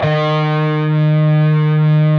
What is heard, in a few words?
PRS E, PRS LEAD, Guitars